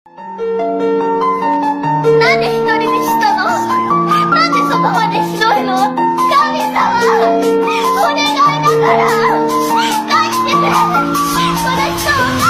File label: Anime Sad; Anime Sad Edits